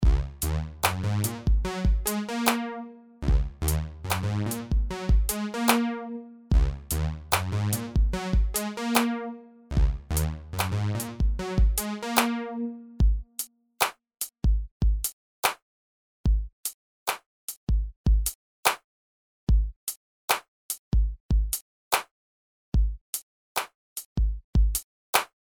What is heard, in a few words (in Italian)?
Idea ritmica